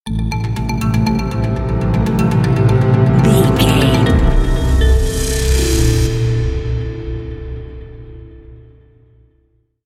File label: evidence crime sting